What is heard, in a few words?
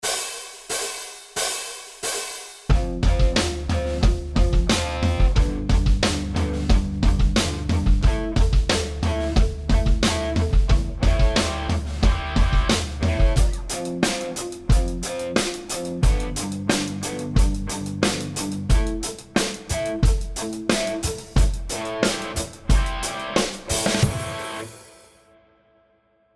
Slide Oktaven F E Am Backing Track